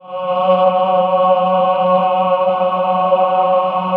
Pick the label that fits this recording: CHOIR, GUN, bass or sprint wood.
CHOIR